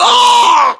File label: death, english